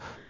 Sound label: techno